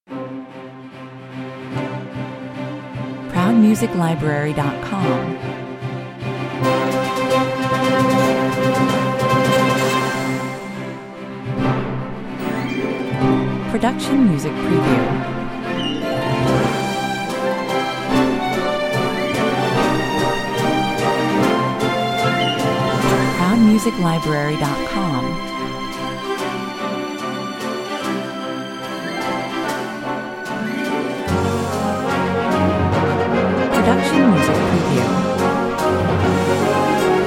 Heroic Hollywood orchestra with positive mood, 114 bpm